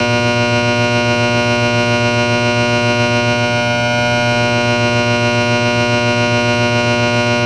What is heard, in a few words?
industrial hum